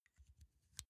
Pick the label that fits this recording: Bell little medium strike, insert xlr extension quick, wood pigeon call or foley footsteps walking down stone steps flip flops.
insert xlr extension quick